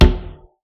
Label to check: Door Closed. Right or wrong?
Right